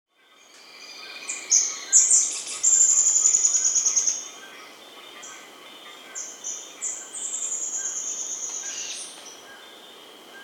Roraiman Flycatcher (Myiophobus Roraimae), Andean Birds; S. America